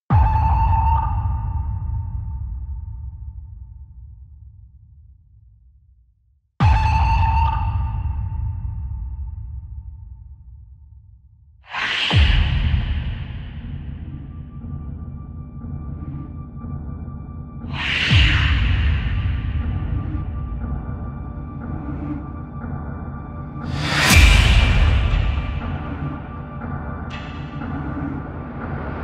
hybrid core music sound conflagration epic intense horror music